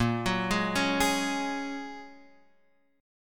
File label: A sharp Minor 7th Sharp 5th, Guitar, Standard; arpeggiated; x,1,4,1,2,4; JGuitar